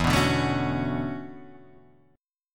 E 13th Suspended 4th; E134 Chord {0 2 0 2 2 2} strummed

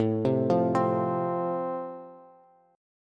A Minor Sharp 5th A, Bass; 17,15,15,17; arpeggiated; JGuitar

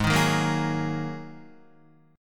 G sharp 7th Suspended 2nd, Guitar; G#7sus2 strummed; 3,6,3,3,6,4; JGuitar

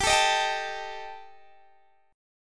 G Suspended 2nd Sharp 5th, Guitar Open D

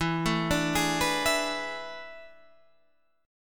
A Major 7th Suspended 2nd Suspended 4th

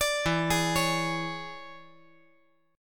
E 13th

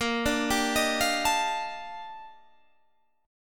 D sharp Major Sharp 11th, A sharp

